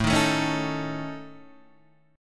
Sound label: A Major Flat 5th, Guitar DADGAD; chord